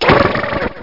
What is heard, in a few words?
duck; pacman